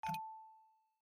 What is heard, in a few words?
Chip Landing; My Cute Commissar